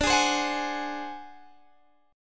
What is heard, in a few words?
D 7th Flat 5th D down Guitar Standard; D7b5 strummed; JGuitar